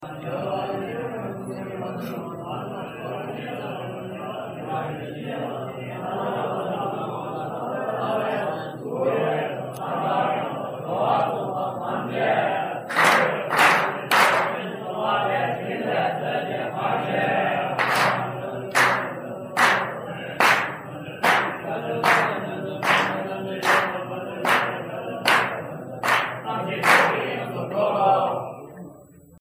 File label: Gyantse Monks